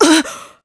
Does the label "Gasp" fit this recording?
Yes